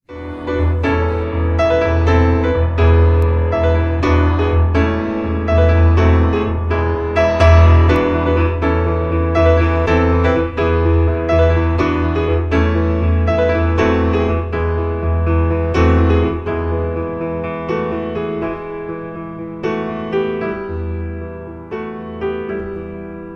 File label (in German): VaterUnser Instrumental